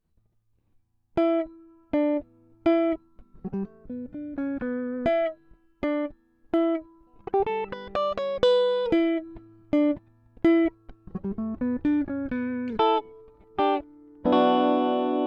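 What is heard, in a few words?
Em 124 guitar jazz riff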